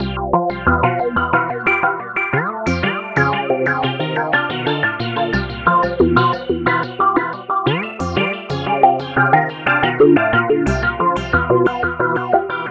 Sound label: acid wall 90